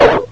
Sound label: pit drone pain; scmod; alienassassin